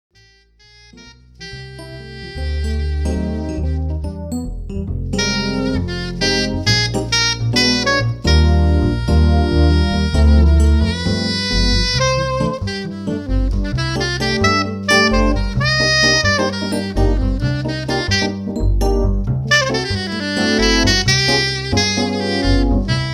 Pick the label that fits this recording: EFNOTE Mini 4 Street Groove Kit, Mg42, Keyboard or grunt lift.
Keyboard